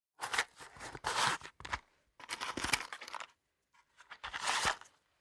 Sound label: Fire Opening Match Box Removing Match Closing Box; Flames; Tom Hutchings